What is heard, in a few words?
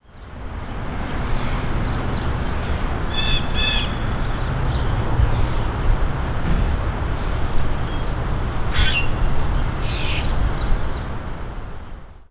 emerging water